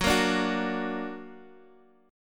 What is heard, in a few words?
Gb Augmented Guitar Half Step Down